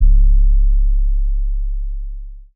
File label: 808 C#; Cymatics